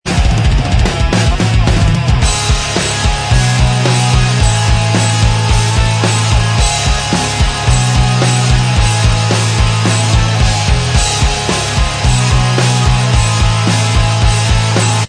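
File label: punked up instrumental track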